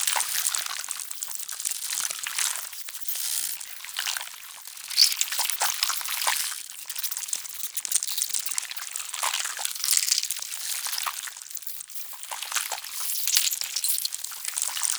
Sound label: Cobweb Loop; Warframe; Duviri Murmur; Ambience